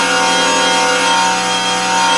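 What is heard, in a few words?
A#3 air bell; Northstar; The Wizard VOL-1; GLASSOMA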